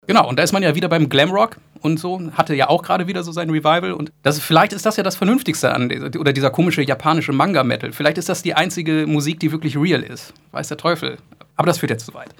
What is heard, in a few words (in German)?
japanischer Metal